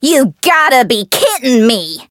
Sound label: fear; nekoko